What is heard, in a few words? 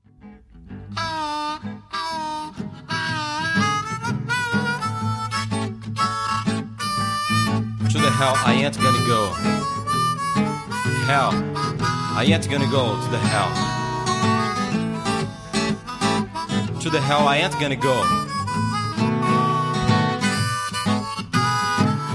blues